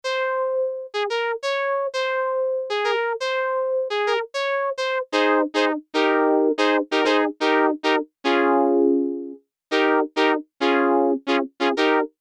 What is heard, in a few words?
Arp beautiful horns